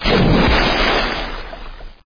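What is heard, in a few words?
water splash short muffled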